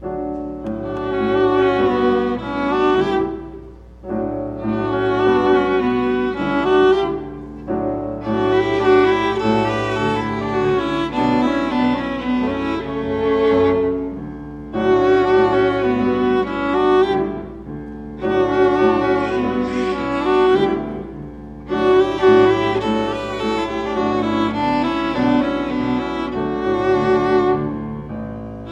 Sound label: flower duet violin duet